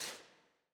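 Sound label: choir "T", women; Sound & Vision - Gigapack I CD 2 (Roland)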